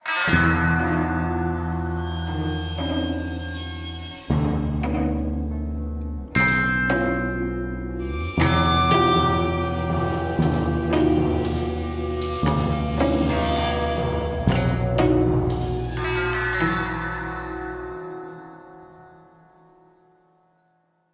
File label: gameplay stinger worst; curious expedition 2 macos ps4 switch windows xbox one xbox series xs gamerip 2021